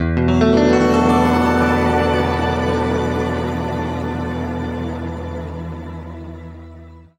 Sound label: E, guitar chords; East West; Steve Stevens Guitar Samples Collection